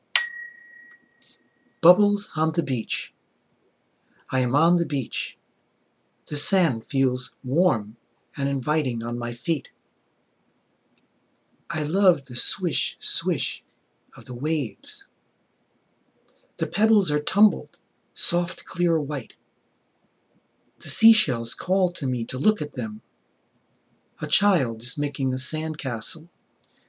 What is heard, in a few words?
Bubbles On The Beach